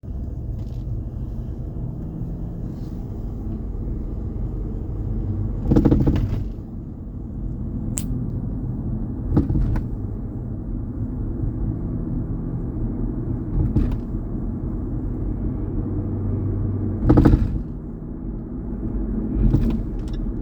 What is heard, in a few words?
f type r vibration noise front right side car bumps